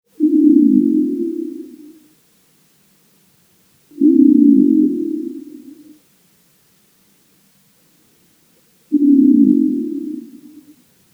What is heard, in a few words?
alligator